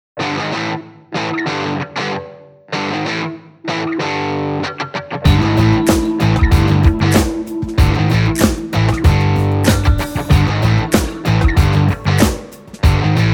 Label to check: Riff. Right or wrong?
Wrong